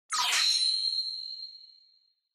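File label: Onoma Syakiin Long, シャキーン; オノマトペ シャキーン01-1(長)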